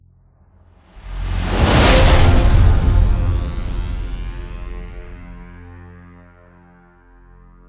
Transition End; Everspace 2

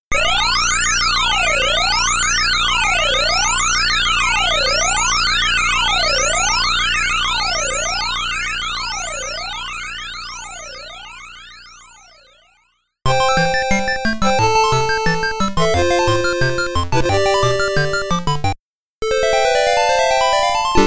Powerball Get, Pattern Clear

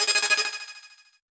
Danger Tile Warning

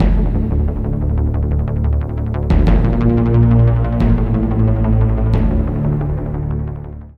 open gate; Chrome

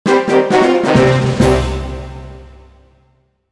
Player Lost Fanfare; Pokemon Battle Revolution; wii

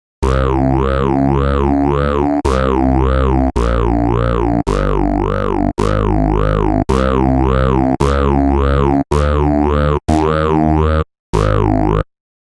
stems synth bass; hot lava; ios macos windows

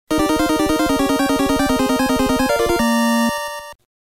All Clear; Konami Shooting Collection

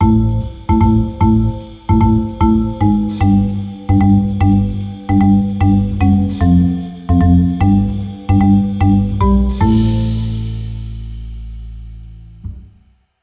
Funky Break

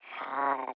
child confirm sound; apocalipsis

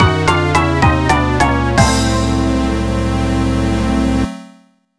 Jingle Over; Wappy Dog

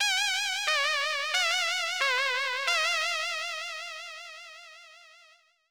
Jingle Results; Harvest Moon: Frantic Farming; NDS

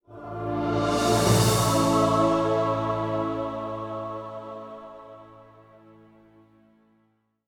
completion sting

